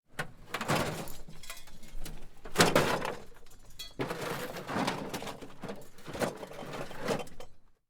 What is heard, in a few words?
junk rummage